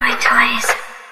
Message Tone

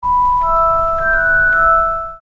Notify; Harry Potter

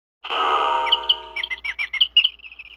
Knock out

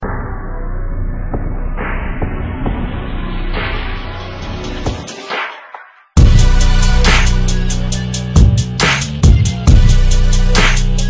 instrumental

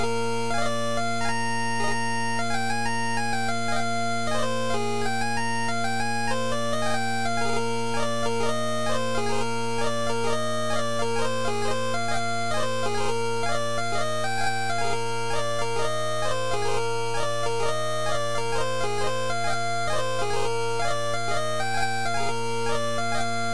Bagpipe

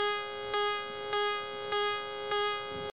Tone Alert; APX

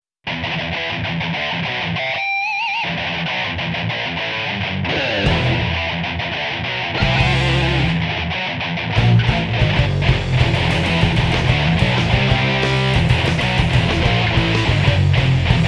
Rock Metal

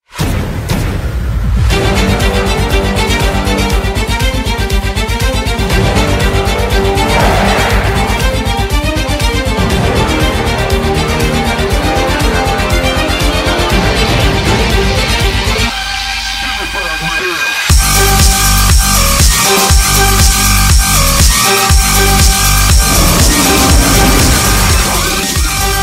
Dubstep theme

